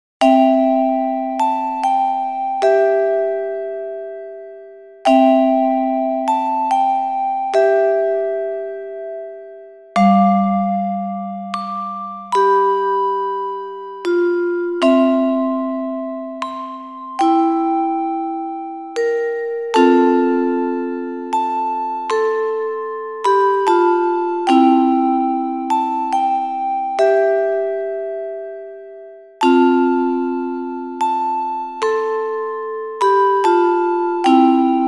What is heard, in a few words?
vibe; kiyoshi konoyoru